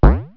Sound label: THUD; toon